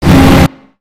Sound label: Robot Air Flail; Lego; creatures mechanical